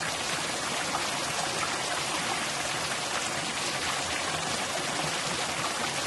waterfall; minetest; ambience

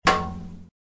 metal bang; minetest; trash can

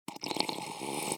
stamina sip; minetest

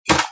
button; minetest; techpack; tubelib

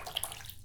watercan watering; minetest; crops

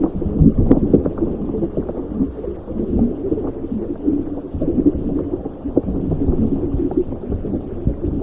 scuba; minetest; ambience

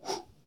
bows shoot; minetest

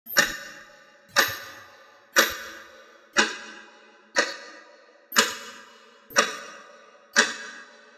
tick tock; minetest; death compass

